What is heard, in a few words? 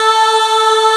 AAH A3, POP CHO